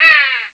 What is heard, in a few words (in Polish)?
wrona